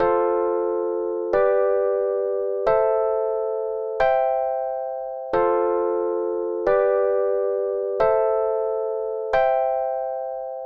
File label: Chord Basic 1 6 4 5